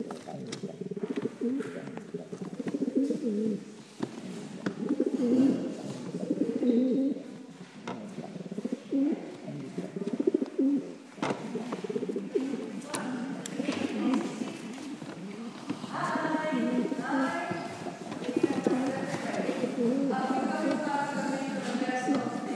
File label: pigeons cooing; beastly birds weekend